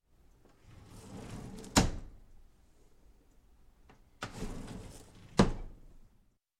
Drawer, Kitchen Open And Close; Sound Ideas 2000 Series

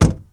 Truck Durango Door Close; Trucks

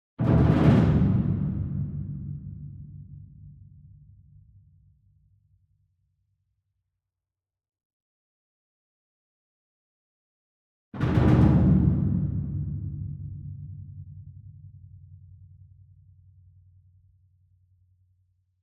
ENSEMBLE DRUM Bass Drum Concert Large Roll Far; boom library; Cinematic Strikes Construction Kit

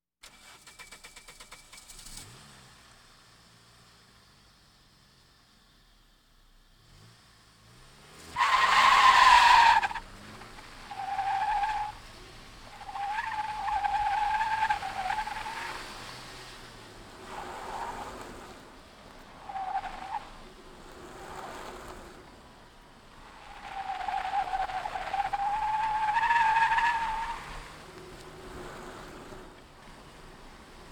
Automobiles various

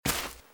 grass; firefight

